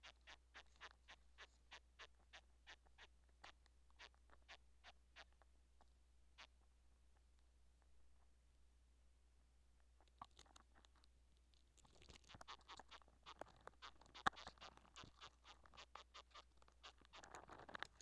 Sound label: chirping